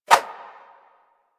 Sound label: Spheres Throw Whoosh